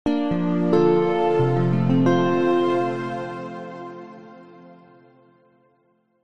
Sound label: musical ident signature soft calm warm sincere bright